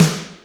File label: Snr Raw Hide, Alesis D4 drum machine